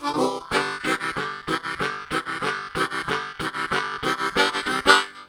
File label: Bass Chug, Harmonica; Zero G